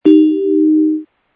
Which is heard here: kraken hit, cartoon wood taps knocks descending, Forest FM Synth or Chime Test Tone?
Chime Test Tone